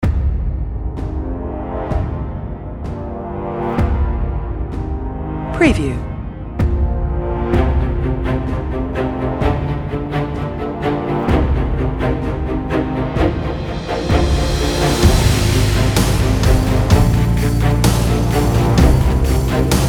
Orchestral Epic Intro - Cinematic Heroic Patriotic Success Victory Inspirational